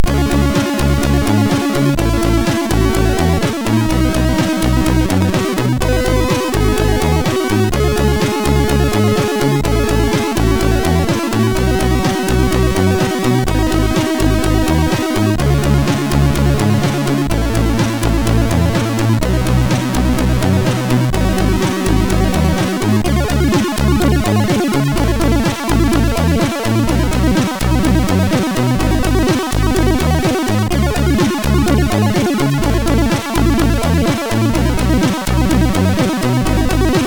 ingame, MOS6581R4; Flummis World

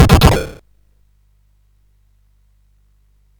SFX; Narco Police

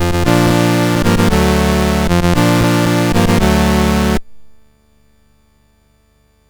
Project Firestart, MOS6581R4

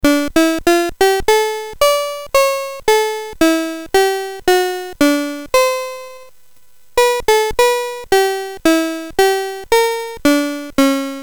Duet, CSG8580; CSG8580R5; Bach Duet BASIC

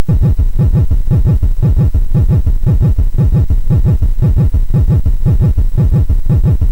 SFX, MOS6581; Narco Police; MOS6581R4; hvsc

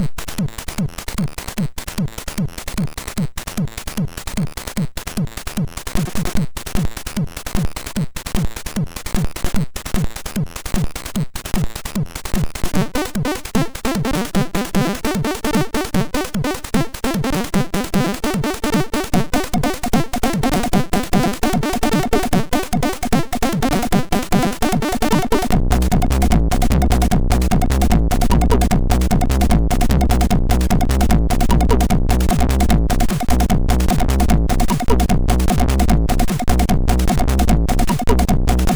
House, sid MOS6581R2

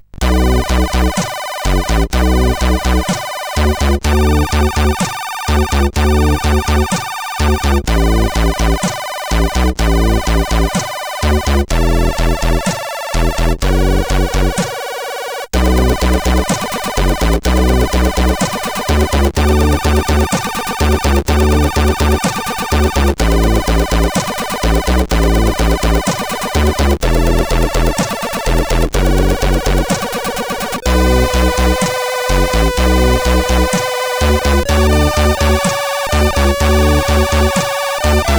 Sad End, CSG8580R5

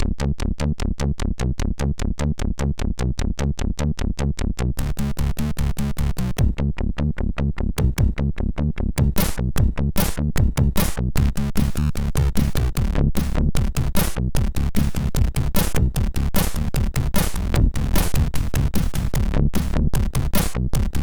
Music, MOS6581R4; sid; Truck MO Music

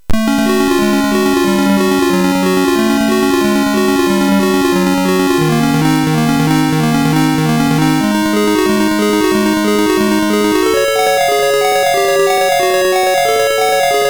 Slap Fight, MOS6581R3; hvsc

